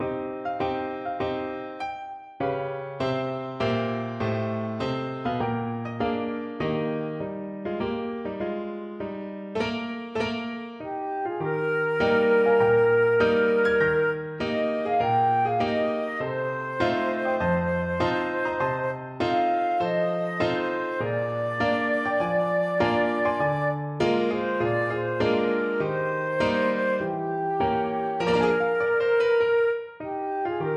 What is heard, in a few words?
flute